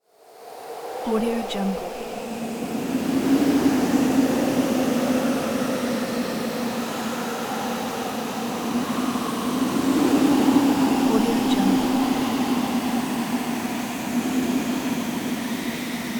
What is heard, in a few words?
heavy winds with white noise hiss; hollywoodedge